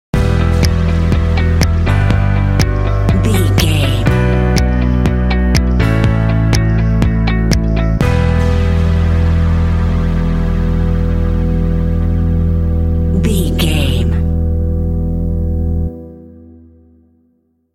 uplifting ambient mood sting

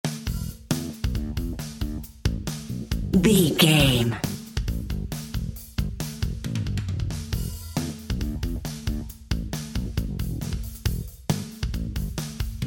80s synth rock anthem drum and bass

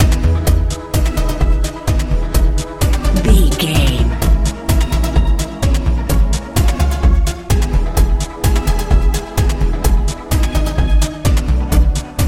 modern dance

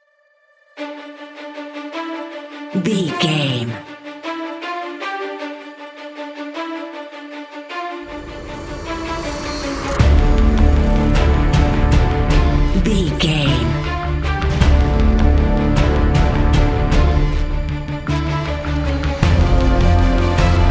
hybrid action cinematic trailer 78bpm

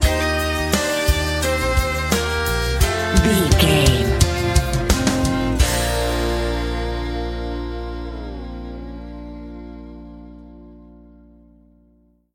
home country bumper

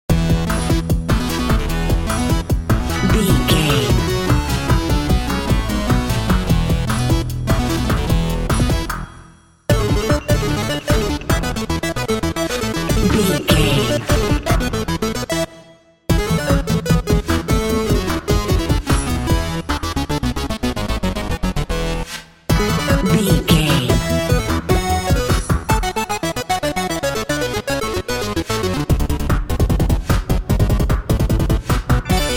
hero theme video game

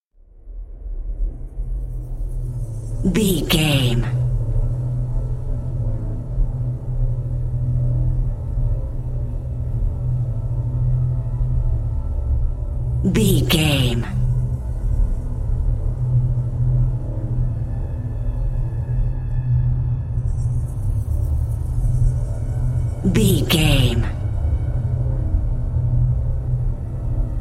dark desolation pads

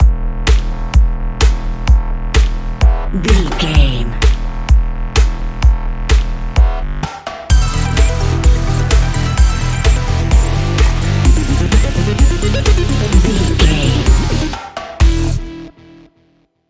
boom nailed it stinger

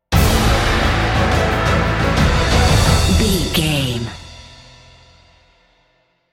epic cinematic sting bumper

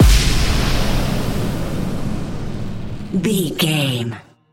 fire magic blast

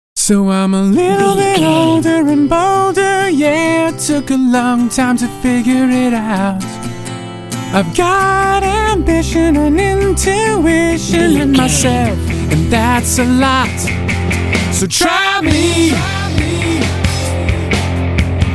vocal

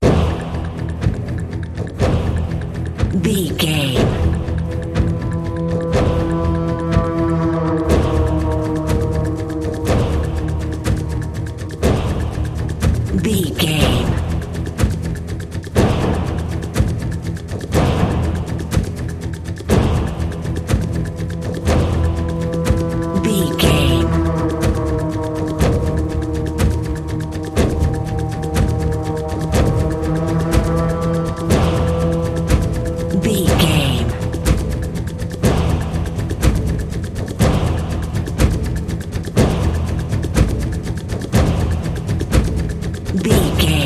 tribal warfare

